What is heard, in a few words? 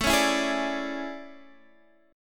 A Major 11th, down Guitar Drop D